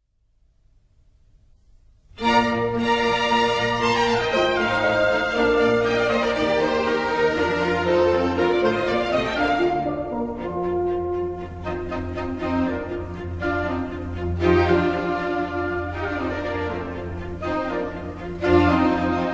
Mozart Violin Concerto in Bb KV 207 - Allegro moderato